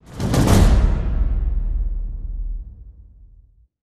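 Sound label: sting, no percussion; transformers war for cybertron gamerip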